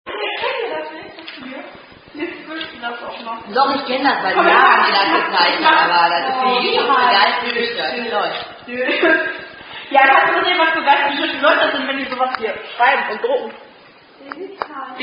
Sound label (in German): German Attack; (Wenn Schule Peinlich Wird...); German Attack (Shows Printed Song)